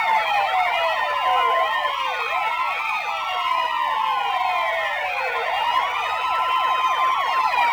siren loop; Soldier Of Fortune II Double Helix; XBOX; ambience

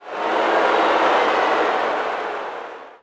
Boo, Crowd, reactions

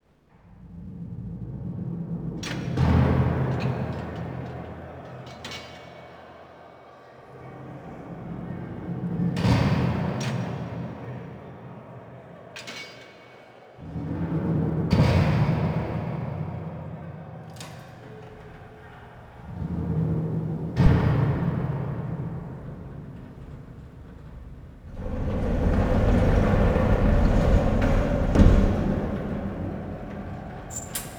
void ambient two; X2 Wolverines Revenge

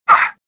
land; stofficer

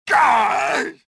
combat; reborn

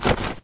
fall dirt; foley

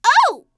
HURT; True Crime Streets Of LA; XBOX

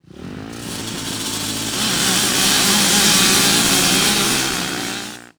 Start, UI

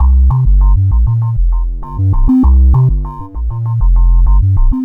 club beat; Star Wars Knights Of The Old Republic II; XBOX

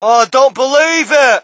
crowd, shout; Mike Tyson Heavyweight Boxing

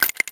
Shell, Machine Gun

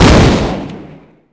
Shot Gun Fire

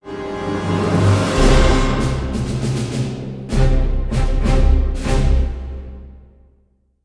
battle, music flourish

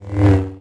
lightsaber swing fast; weapons

